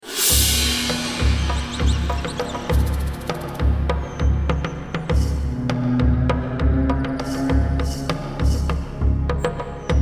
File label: Peace Relax; Serious Sam 2; Mdigbo City Suburbs